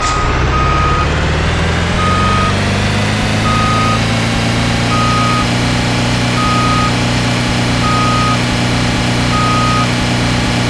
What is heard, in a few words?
reverse, truck; vehicles; garrysmod